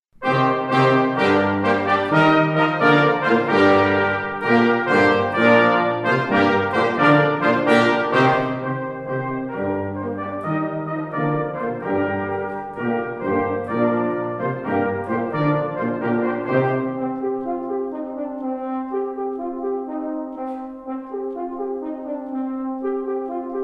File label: gaudete quintet